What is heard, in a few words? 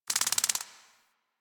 Insect; Warframe; Hub; Liset Alchemy Lab